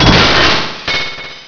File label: BANG